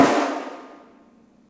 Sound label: Snares, Real